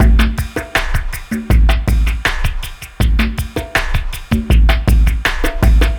Brazil Dream, сэмпл ударных ambient

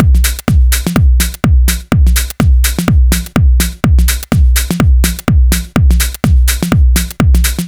Bottleneck

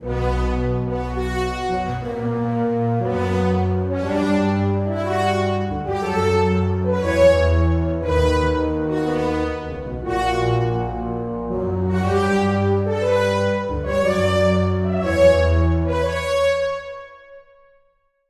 Fanfare

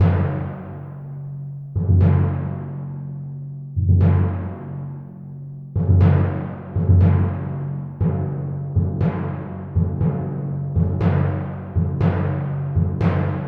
timpani

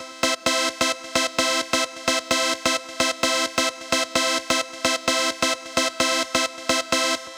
Dancer Keys, electric jazz

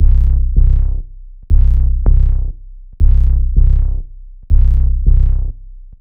Acou Synth; Downbeat

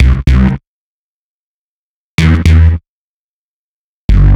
Head Bass; Бас сэмпл (Ритм-н-блюз); Rnb, Soul; 110 BPM; loop

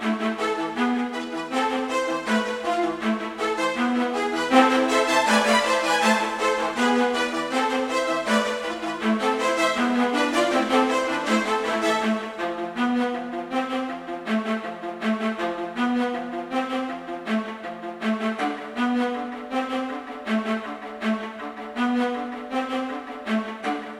viola; звук для фильмов и кино виола

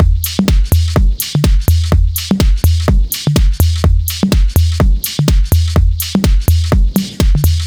Minimal 808, диско-хаус

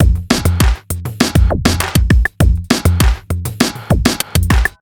Twang

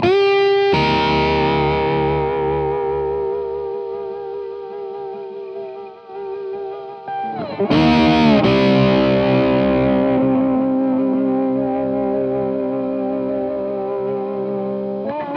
Western Slide

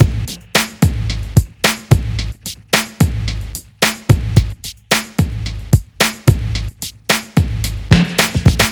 Noisy

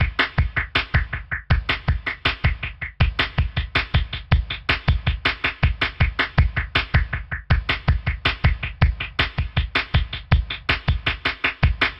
Brazil Dream, ambient

